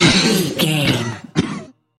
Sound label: troll monster cough